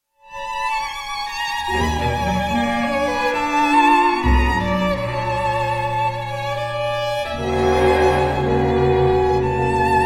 concerto for cello and orchestra

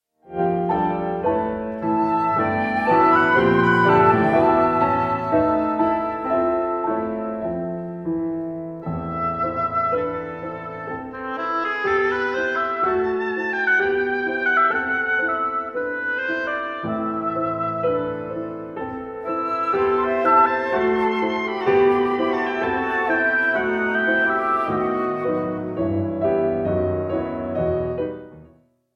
trio for flute oboe and piano; Madeleine Dring

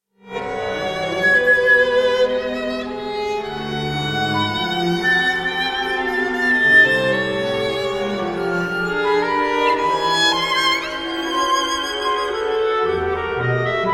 concerto for violin and orchestra 2nd movement; sally beamish

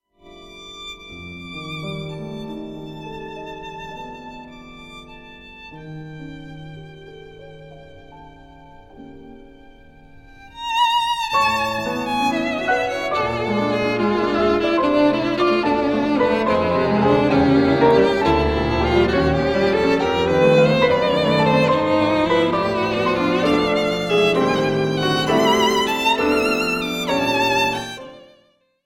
sonata no 2 for violin and piano; Frederick Delius; 1923

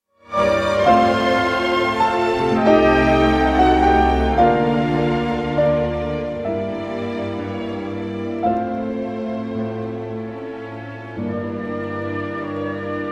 concerto op 90 for piano and orchestra

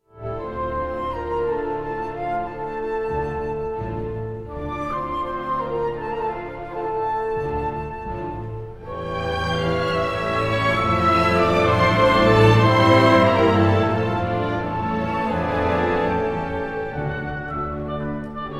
symphony orchestra, mazurka; james judd; edward elgar; new zealand symphony orchestra

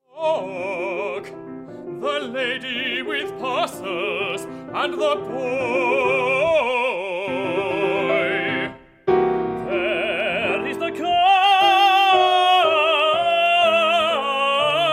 guildhall school choir; benjamin britten iain burnside; to lie flat on the back for voice and piano

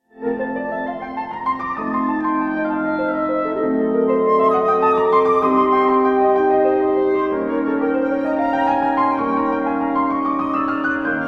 sextet in c minor op 40 for piano and wind

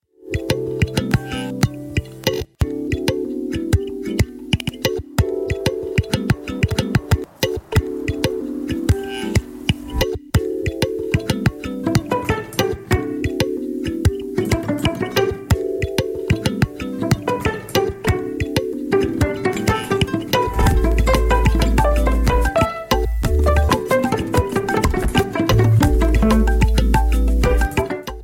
musicbox kalimba concept

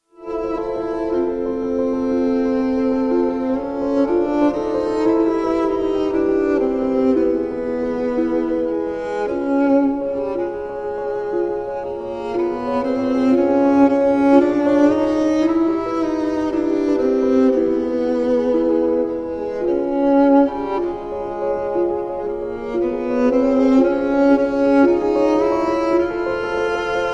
sonata in f minor for violin and piano; op 80

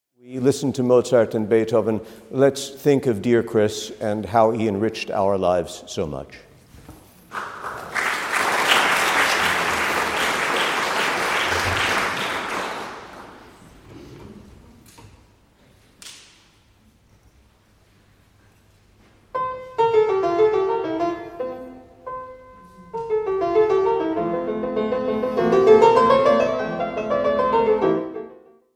piano sonata in F major; wolfgang amadeus mozart

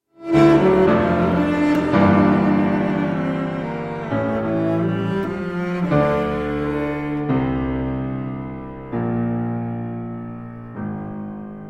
sonata in g minor op 65 for cello and piano

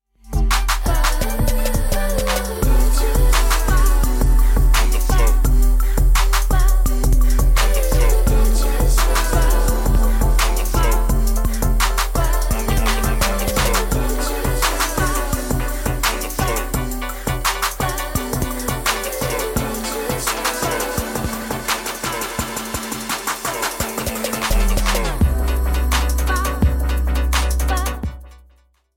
machinedrum

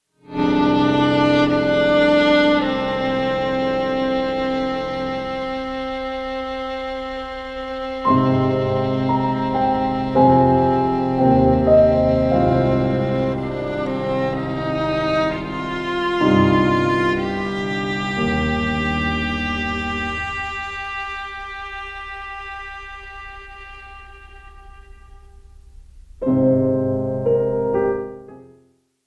sonata in e flat major for violin and piano lento

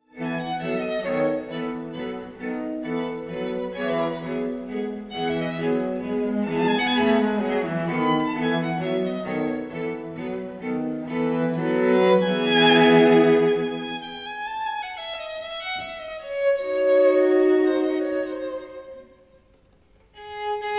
string trio in g major; ludwig van beethoven; op 9 no 1

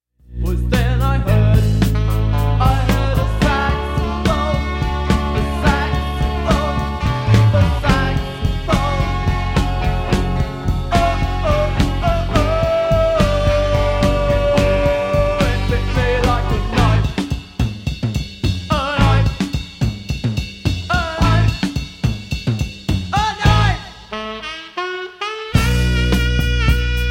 saxophone; bbc session

